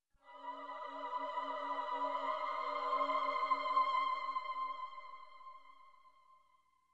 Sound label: Mysterious appearance sound effect